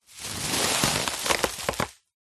foley plastic bag thin empty blow up inflate

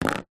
money coin set down on cardboard box; zapsplat

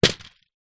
seed pod flamboyant tree drop on floor inside; foley

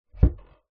household laundry chute wooden lid close softly; zapsplat

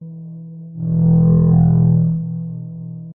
Swell Sci Fi Abstract Aggressive Short; Skyes Audio

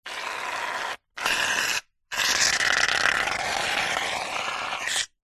household shaving foam squirt

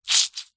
paper wet damp squeeze squelch; foley

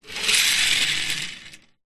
toy car metal push release wood surface

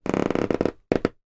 foley floor creaky through carpet

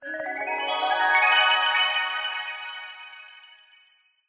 fantasy magic glissando 90s style dreamy ascend

